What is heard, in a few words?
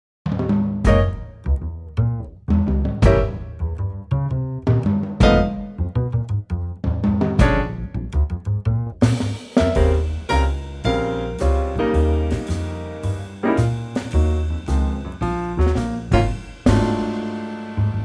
Db Trio; key - Db - vocal range - B to Eb